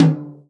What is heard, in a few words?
tom, Real Drum Kit, jungle kit